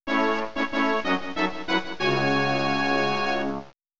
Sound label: Victory Fanfare